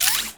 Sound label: Ball Flash Reverse; orianna